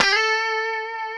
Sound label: A 4, COMPR STRAT, Guitars; Spectrasonics; Hans Zimmer Guitars VOL-1; 90's Sample CDs